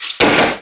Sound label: zap, energy; Counter Strike Model Pack; CS:GO